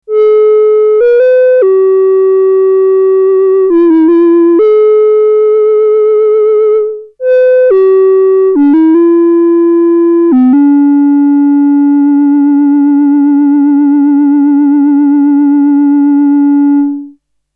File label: Behringer Model D Flute Patch